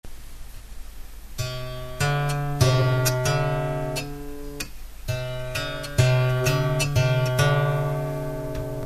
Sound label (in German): kleine sekunde, musik